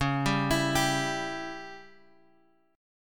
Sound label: C sharp Diminished C sharp; C#dim Chord {X 4 5 X 5 3} arpeggiated